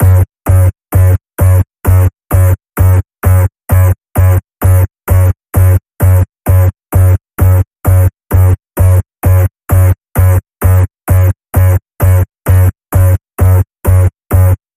Digital Rewind Pulse, F sharp

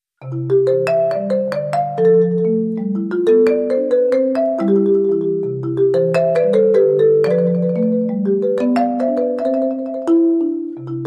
Solo Marimba

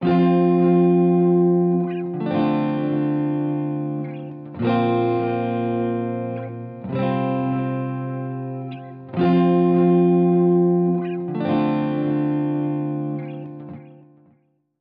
Bright Confident Beginning, No Drums

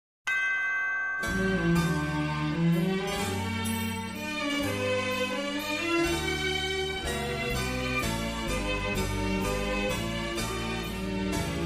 The First Noel, Brass Band and Small Group

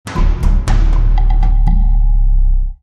Sting

